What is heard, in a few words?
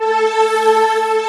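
ambient pad, synthesizer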